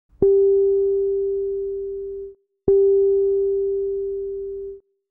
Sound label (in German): Daumen-Flageolett, Bass